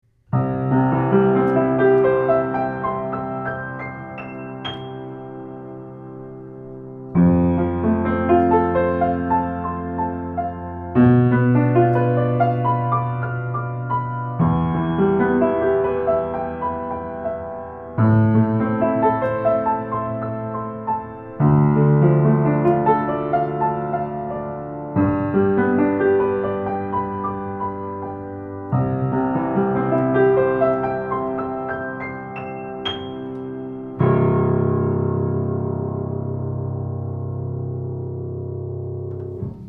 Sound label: Schoeps CMC6MK2S Piano 160cm behind player